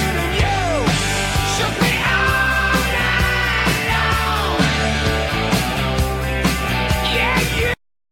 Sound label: chorus